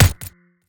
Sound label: chain Gun Shot